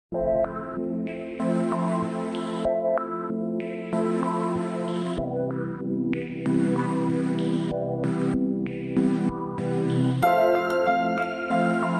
curio music; Omori sound replacements

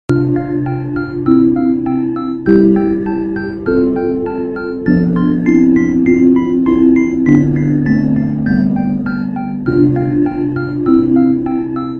music stinger game over win; Omori sound replacements

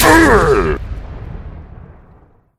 stone form impact, thwomp; ivy

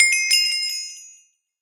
ui hud acquire ap, level up; xp level up; soul orb gain; Minecraft

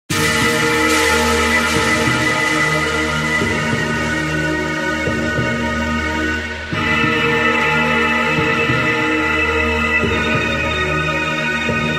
music idol carry distant 141bpm; Omori sound replacements

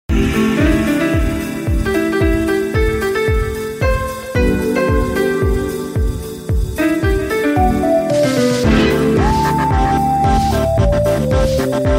music hideout play high lp 155bpm; Omori sound replacements

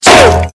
rising ram impact; billy ed edd n eddy sfx

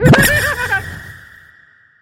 magician animal curse transform; kitty0706 sinclair sounds

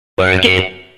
vault hit stinger; sinners

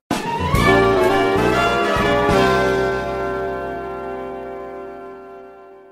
music stinger player respawn; Family Guy respawn

